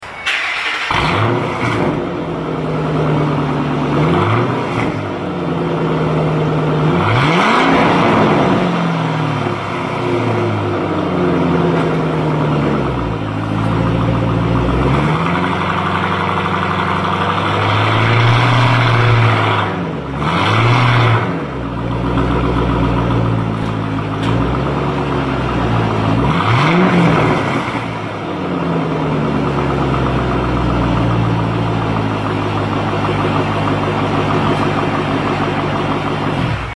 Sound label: Mustang GT no mufflers, exhaust sounds